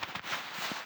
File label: Get Up; Zote